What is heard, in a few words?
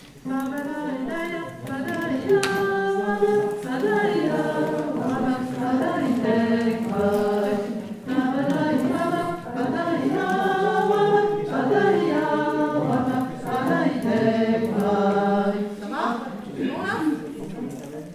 Pabada altos